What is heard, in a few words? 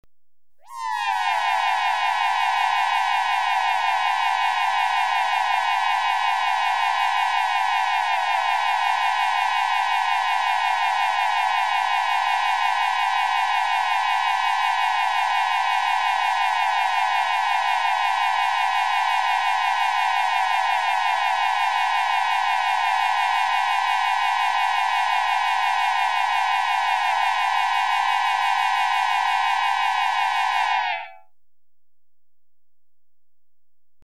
Rapid Warble Alarm (Higher Pitch), Electronically Generated Sounds; BBC Sound Effects Library